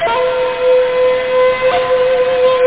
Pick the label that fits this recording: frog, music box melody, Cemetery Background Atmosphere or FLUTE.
FLUTE